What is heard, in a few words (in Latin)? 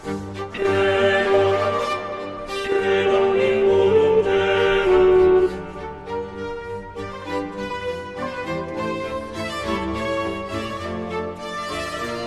Credo alto; Stage Zelenka 2023; zelenka zwv21